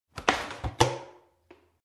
Garbage can lid

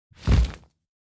Eagle landing

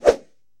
Karate palm swing

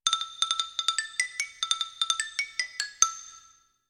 xylophone melody; William Tell Overture

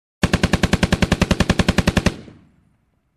Machine gun shooting long

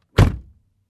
Closing Car Door

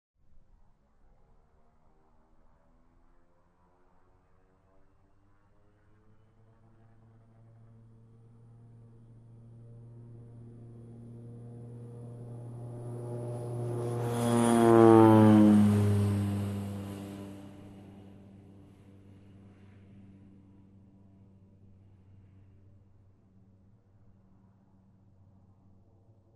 Light Aircraft Flying by whirring sound

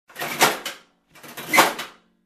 Bread Toaster Switcher clank

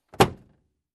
Closing Glovebox

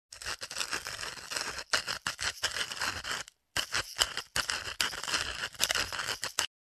Wood Scratching

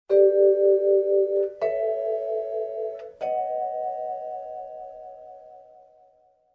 Ascending vibraphone jazz chords

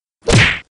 Face punch sound effect

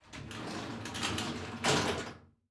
Shower door opening

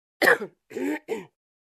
Adult Woman Coughing

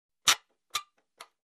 Metal Gear Squeak

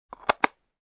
Case double click

